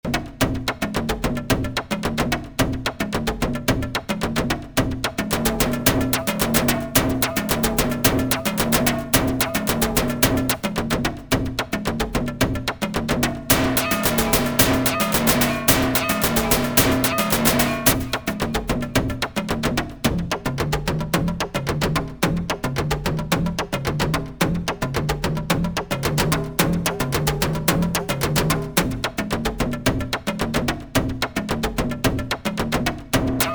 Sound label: metallic percussion sounds with SY Tone; This the ‘jam’ at the end of it. 3x SY Tone; Syntakt Science Lab 11